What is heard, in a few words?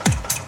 kick; tractor georga